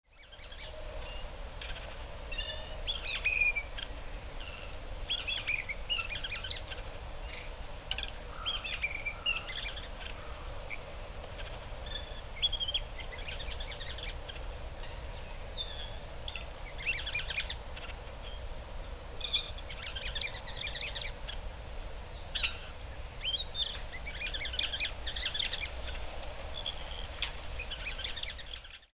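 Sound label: kibitaki, Narcissus Flycatcher; hyogo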